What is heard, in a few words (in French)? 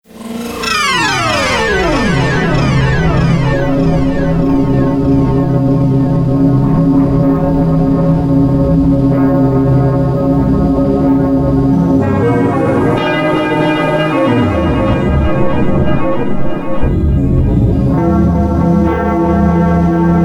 les cloches